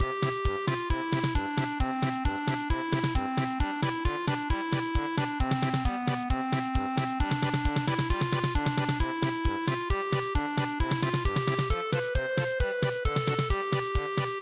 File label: polka